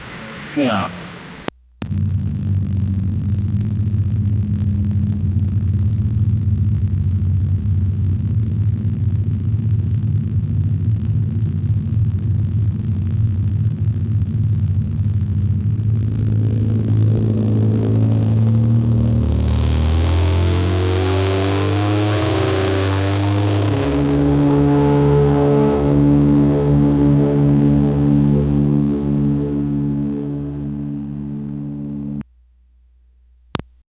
high pitched and melodic

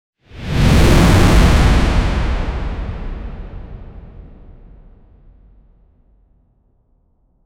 burst of light flash

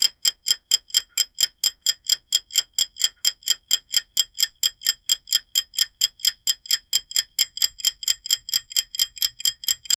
timer starts; 10 minute

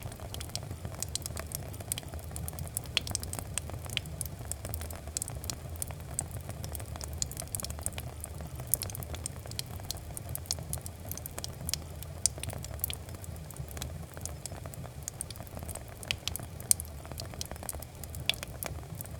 inside a cottage soup boi, boiling

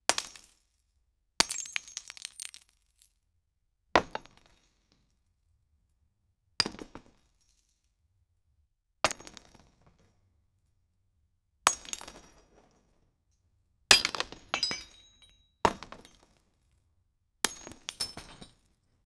hammers falls and breaks, construction

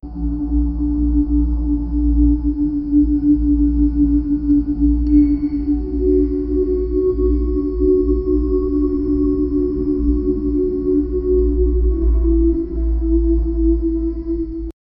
village night; 19th century; lovecraft

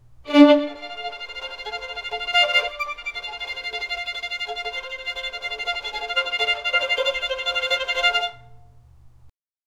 short orchestra, psychological

